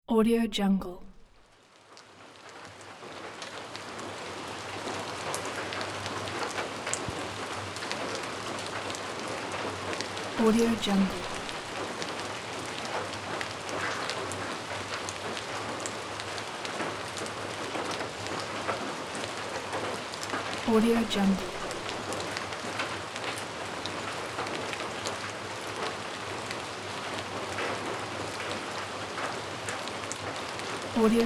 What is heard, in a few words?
calm rain; alexleadsounds